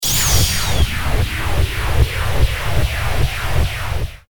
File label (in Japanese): 気を溜める